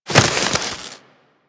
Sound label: Generic Roll Ice; Characters; Warframe